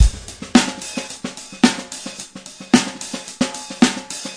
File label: 110 bpm shuffle, drum loops and breaks; shnuffle